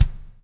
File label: BLUES TK, Backbeat; Spectrasonics - Backbeat